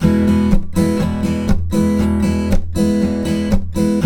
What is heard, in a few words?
Blues Guitar Samples, RIFF; ILIO; Fretworks